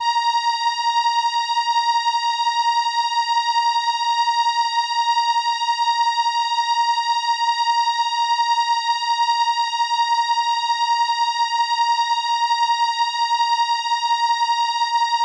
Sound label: Trumpet A#6, ARP Solina